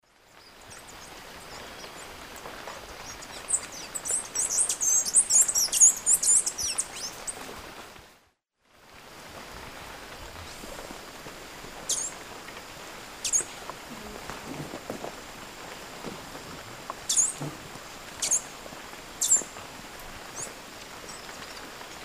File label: Tersina viridis, song and calls; S. America; Eastern Lowlands